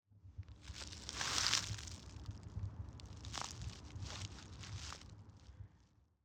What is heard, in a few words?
a step on dry leaves